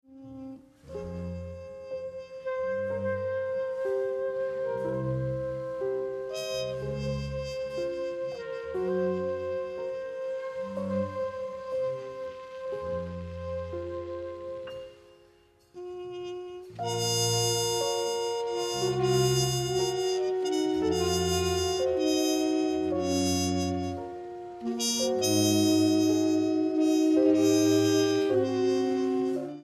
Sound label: Drumming